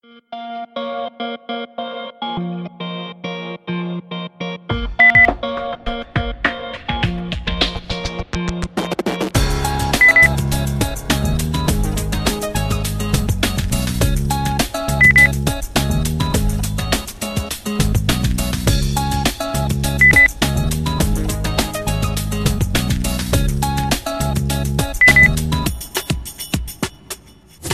lounge

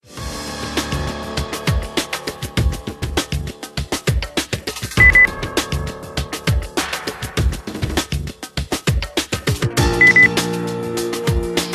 percussion

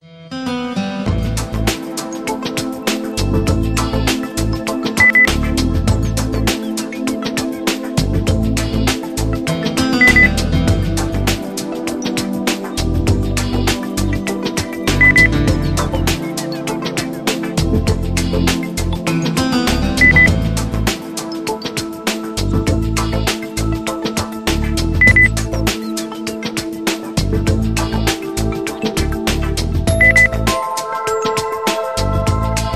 lounge

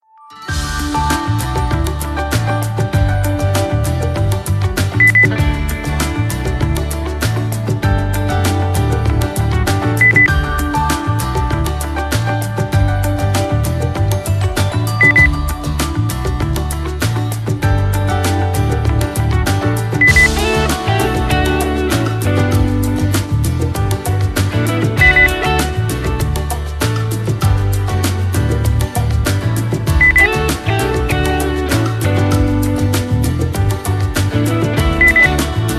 pop

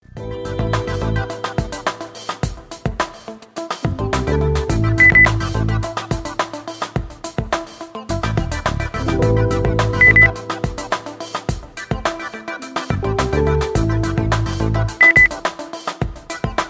lounge

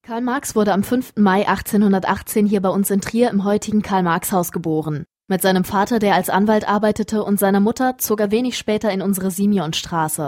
viola

